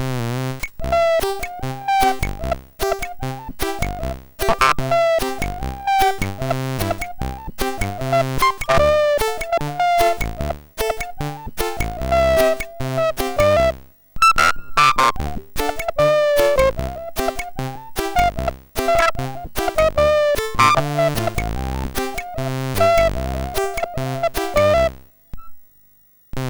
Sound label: Ringtone Arabic, MOS6581R4; Nokia